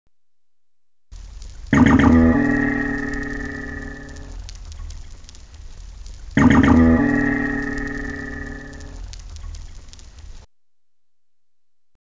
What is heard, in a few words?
dwarf minke whale sound